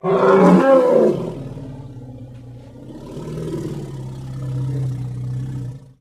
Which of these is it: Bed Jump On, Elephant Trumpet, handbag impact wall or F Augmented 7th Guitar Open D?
Elephant Trumpet